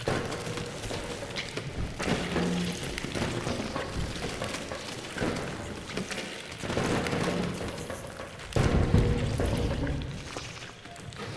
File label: Swarm Under metal; silent hill homecoming; ps3